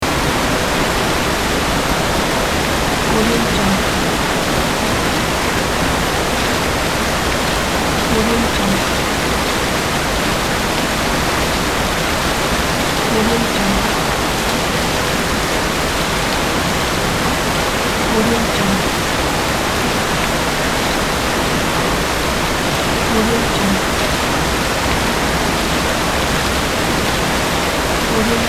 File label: waterfall; lfo-sound